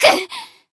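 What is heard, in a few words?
Voice damaged, Japanese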